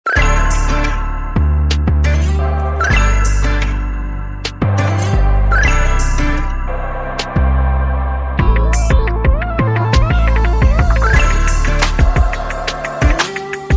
No Vocals

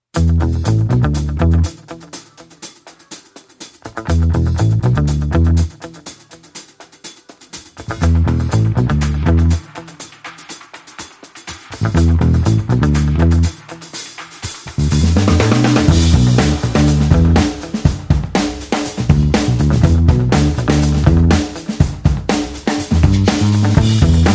Only Drums and Bass

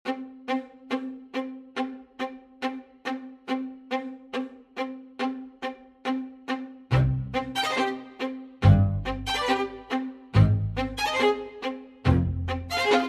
Strings Percussion and Effects

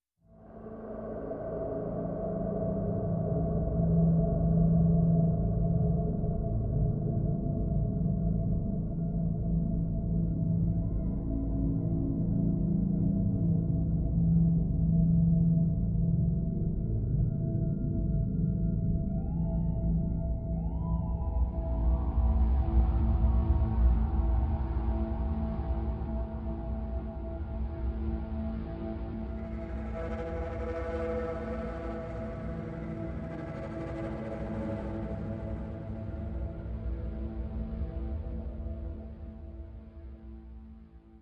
Pads Only

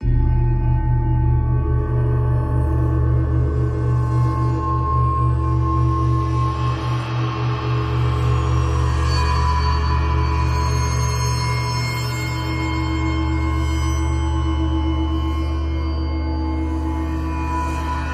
Eerie

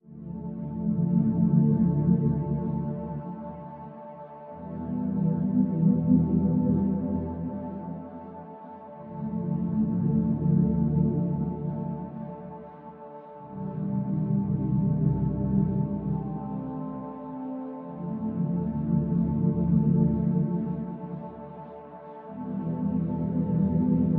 Ambient

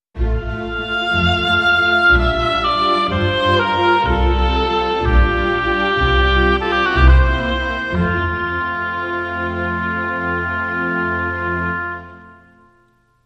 Piano Andante Sting

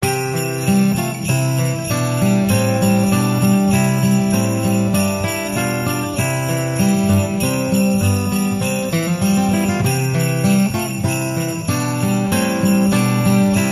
Deck The Halls

